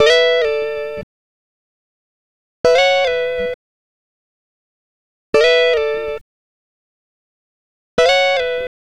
Guitar Licks

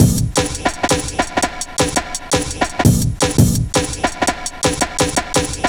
Old School, Jungle, Drum Loops 168.5; Zero-G Jungle Warfare 1, 2 & 3

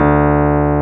Rhodes; Greytsounds; CLASS RHODES

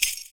FOOT PERC; Univers Sons - Raricussions

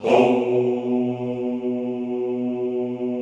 HOUUU, Classical Choir

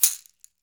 CAXIXI, Latin Shakers

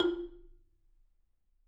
F3, Marimba; Sampleheads; Dave Samuels Marimba & Vibes

